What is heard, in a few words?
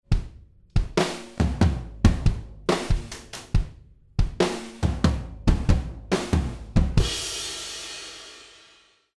DRUMS EQ BYPASS